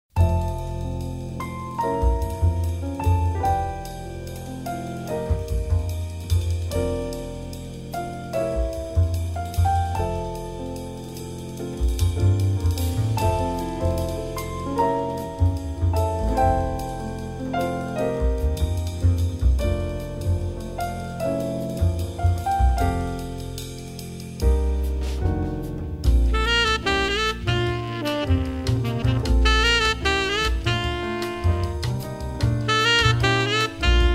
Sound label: fireworks; grasshopper